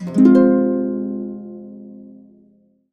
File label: Harps; USB Soundscan vol.62; Complete Harps; AKAI